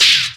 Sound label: record stop; Graz